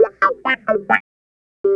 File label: Clavinet; Ambient Grooves